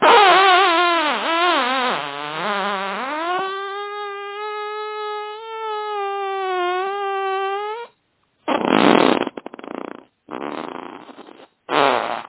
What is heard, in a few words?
ringer fart